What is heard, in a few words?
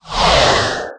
move air jet